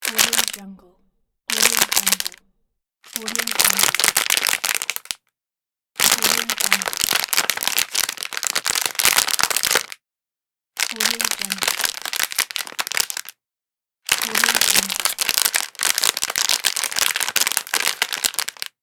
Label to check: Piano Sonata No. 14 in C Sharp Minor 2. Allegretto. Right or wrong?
Wrong